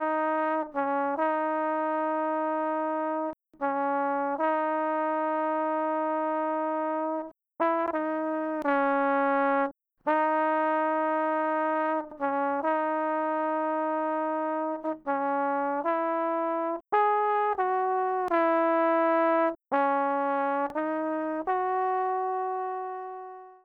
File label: Trombone